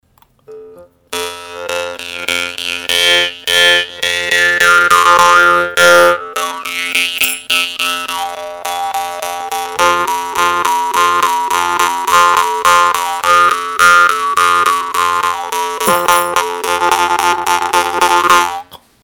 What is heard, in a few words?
f2 87,31 hz, harp